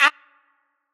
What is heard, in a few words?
Vocal Glitch